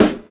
low snare single hit D# key, Royality free snare sound tuned to the D# note.; snare drum samples